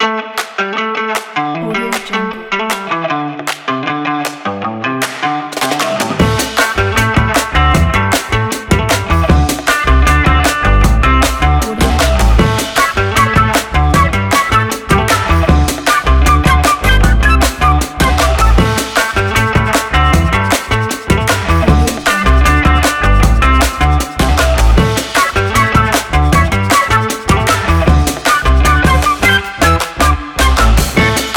upbeat retro

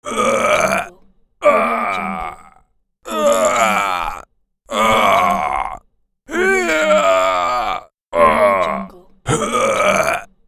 death voice

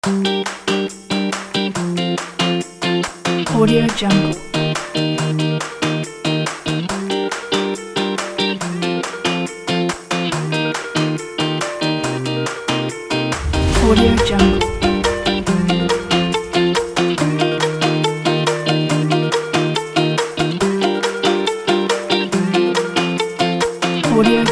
positive spring

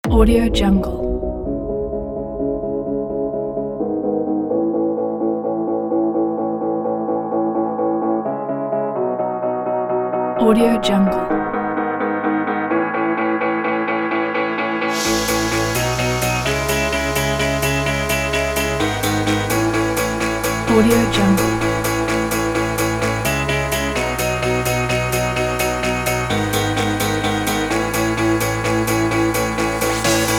positive dance piano house

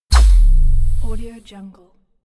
puff

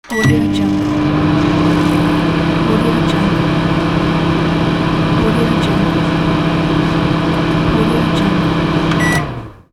microwave; stockgold

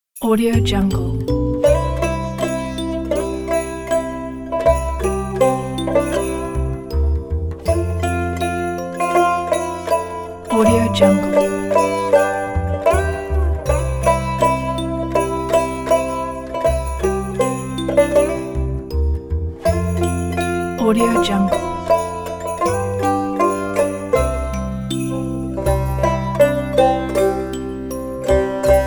jingle bells middle east

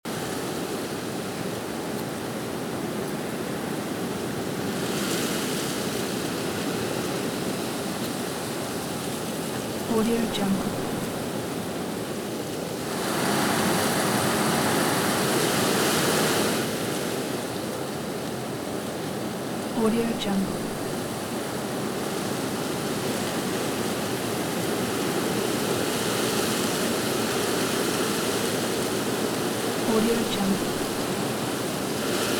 weather wind landscape leaves rustle

